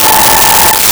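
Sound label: Distortion Guitar A5; Nintendo; July 2020 Gigaleak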